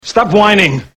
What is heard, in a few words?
whining; svencoop